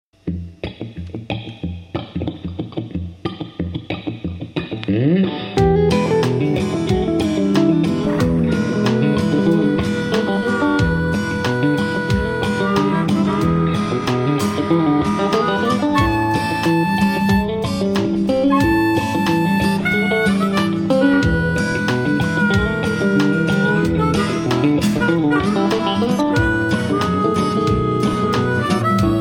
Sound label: Harpin